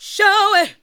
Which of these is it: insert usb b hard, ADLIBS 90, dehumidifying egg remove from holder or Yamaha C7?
ADLIBS 90